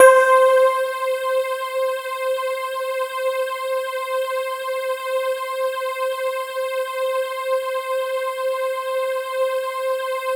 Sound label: C5, Synth Line; 90 s Sample CDs; NiceBeats; SynthLine VOL-31